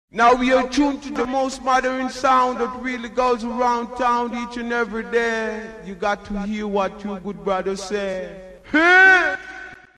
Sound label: Modern sound